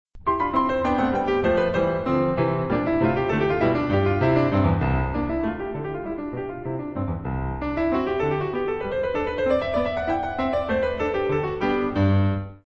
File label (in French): Sonatine, piano; Final (en forme de tambourin); ProPiano